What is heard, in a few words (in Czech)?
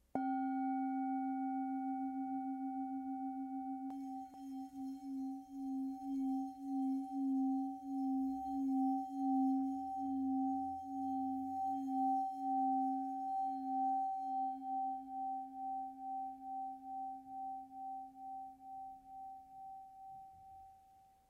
tibetska misa; dawa stredni